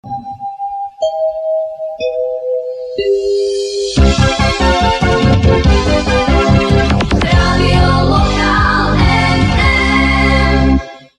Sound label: jingle